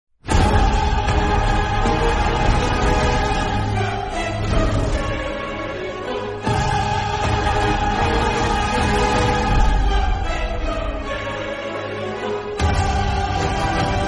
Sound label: Bgm South; Ponniyin Selvan 2